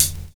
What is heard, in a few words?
NOISY; Univers Sons